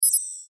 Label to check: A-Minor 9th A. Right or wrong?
Wrong